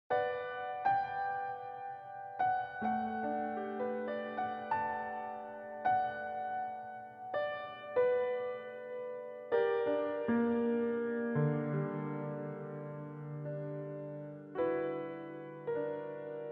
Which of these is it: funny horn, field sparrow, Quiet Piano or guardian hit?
Quiet Piano